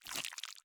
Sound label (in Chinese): 蜜脾上蜡声